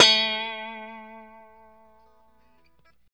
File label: POP A 3, STRAT, Guitars; Spectrasonics; Hans Zimmer Guitars VOL-1; JC-STRAT POP